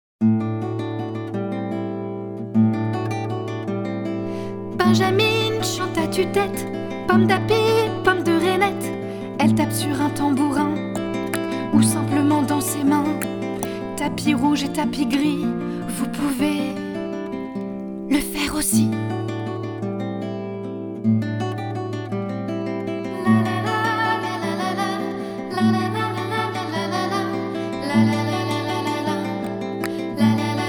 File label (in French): comptine